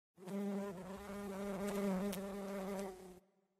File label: Amb Birdvillage Bees